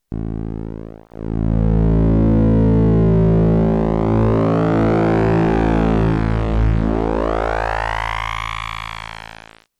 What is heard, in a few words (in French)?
bruit qui fait mal aux oreilles